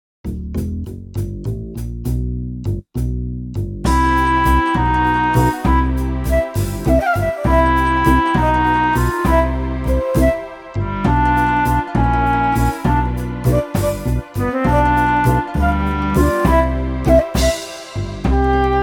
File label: Waltz